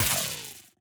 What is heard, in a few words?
ferro shock hit